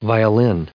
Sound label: violin